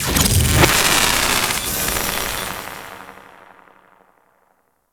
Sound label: Grineer Body Dematerialize; Warframe